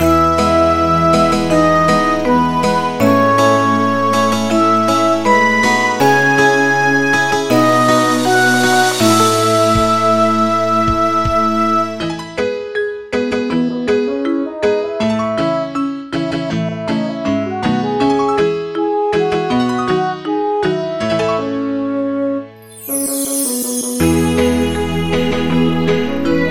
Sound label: rain drop